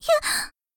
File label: Shyness